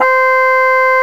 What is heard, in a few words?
Bassoon E3